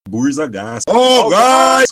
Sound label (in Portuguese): vinheta